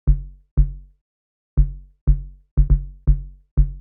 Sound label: Kick; VariBreaks; hydrogen